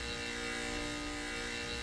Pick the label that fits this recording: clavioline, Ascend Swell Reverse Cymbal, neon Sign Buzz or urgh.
neon Sign Buzz